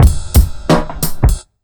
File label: BEAT, Underground Hip Hop